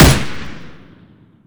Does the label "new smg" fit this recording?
Yes